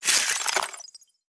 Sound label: Freeze